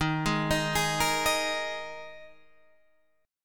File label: A sharp 7th Suspended 4th D sharp